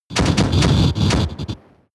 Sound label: military jet bomber shot damaged